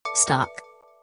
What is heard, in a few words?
bell